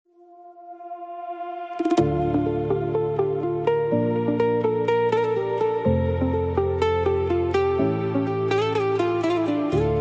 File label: deep house